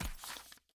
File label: ui journal open button click